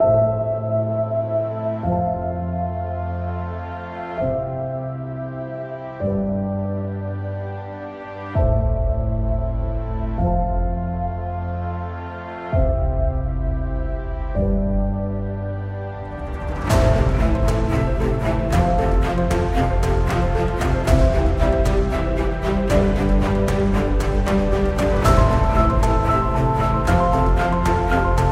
Inspiring Cinematic Piano

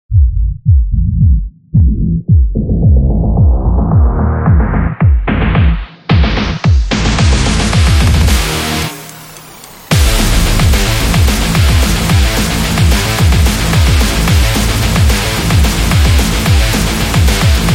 The Metallic; sports training energy

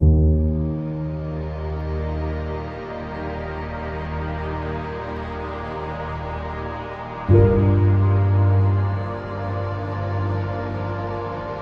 Relaxing

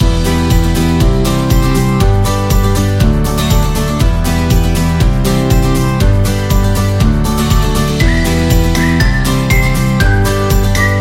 Upbeat Loop Corporate; hi tech

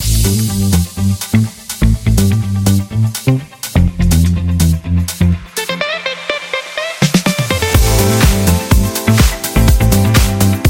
Disco House

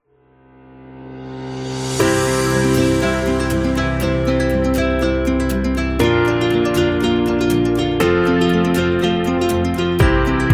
Wedding Romantic, love story

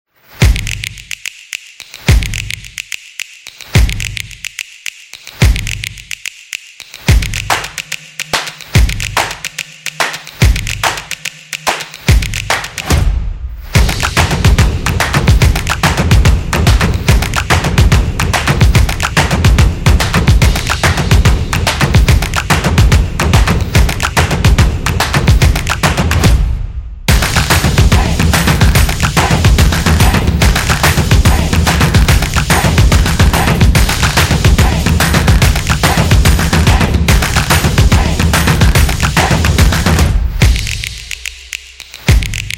Clapping Beat

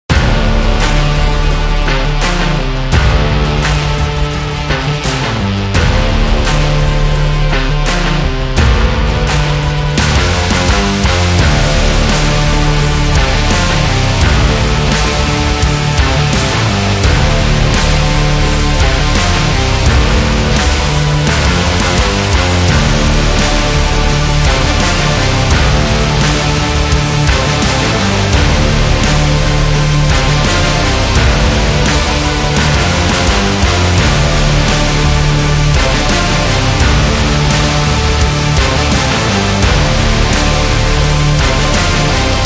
Hip Hop and Rock Cinematic Trailer

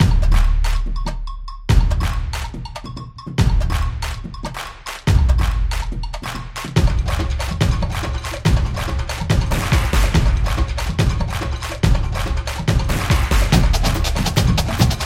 Latin Percussion, samba

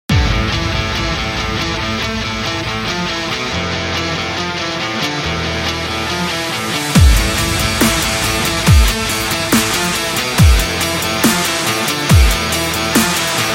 Workout

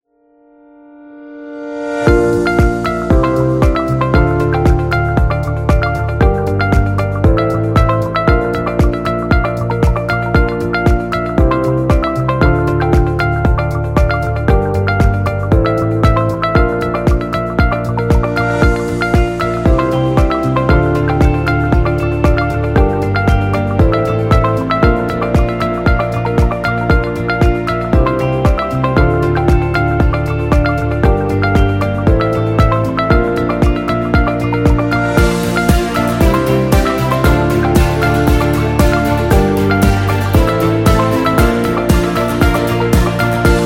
Podcast Music

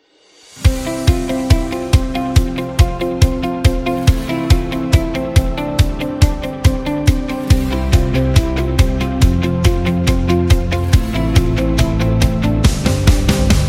Upbeat Motivation

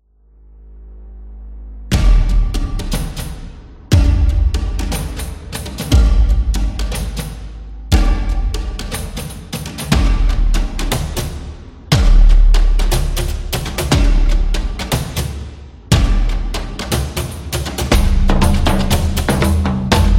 African Beat

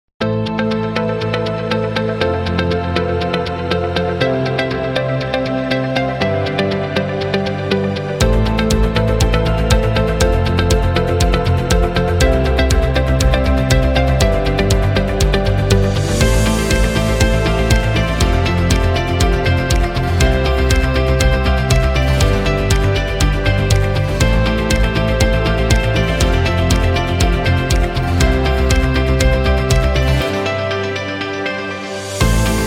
Corporate Background

